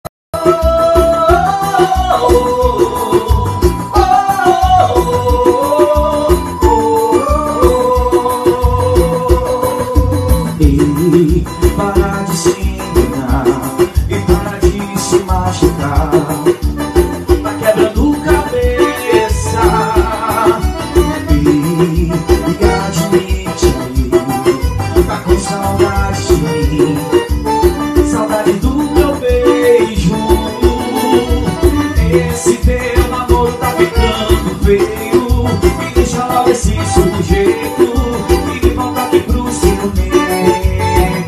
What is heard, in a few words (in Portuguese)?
backing vocal; fiqueemcasacovid19